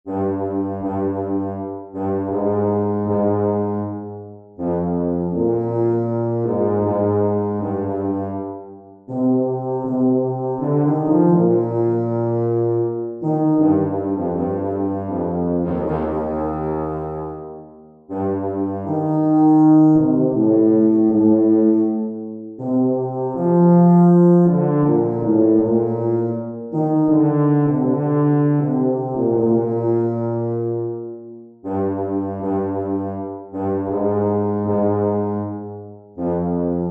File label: tuba audition